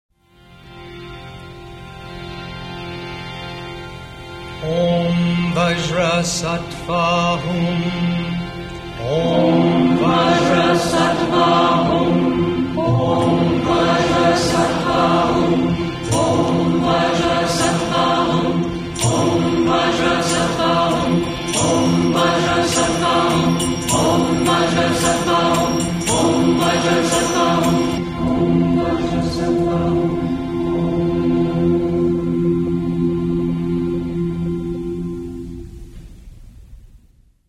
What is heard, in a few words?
Om Vajrasattva Hum